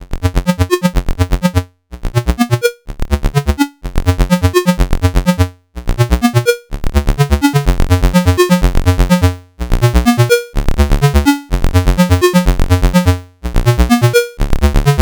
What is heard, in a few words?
SQUARE 50 percent PW